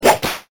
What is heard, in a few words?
whip